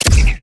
chain whip

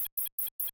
ui sos

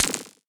atk elecwand

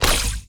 dark staff eye

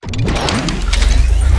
earth impact

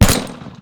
skill burst shot water cast

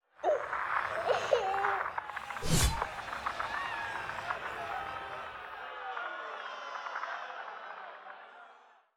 spirit whisper

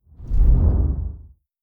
human change

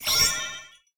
blessing magic

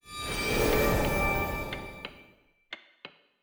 hourglass

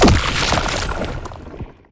imp sea sword

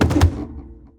guitar fall ground

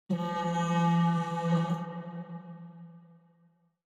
horn

